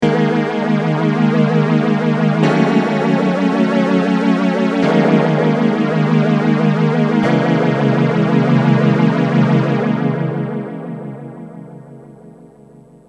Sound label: Mutron Phasor II